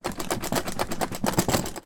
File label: foley flightcase shake